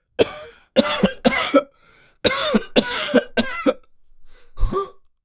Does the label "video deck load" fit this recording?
No